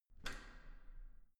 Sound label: entrance door handle down soft